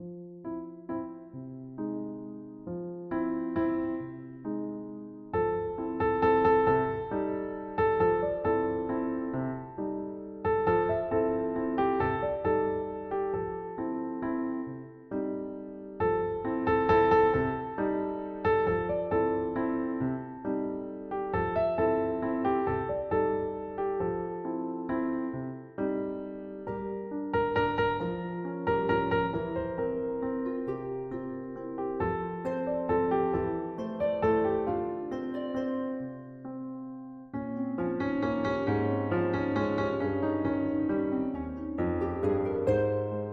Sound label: Waltz